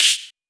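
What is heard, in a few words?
TR909 Snare, Roland SH-32 Drumset; Drumset 04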